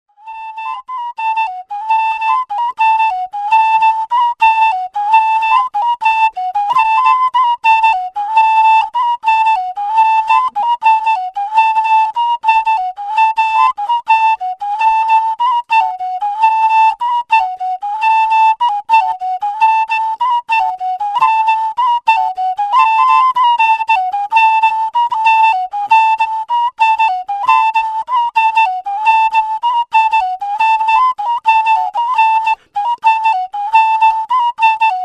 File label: yerik, ghana bimoba